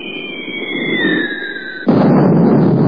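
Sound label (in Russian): звук когда бомба падает и взрывается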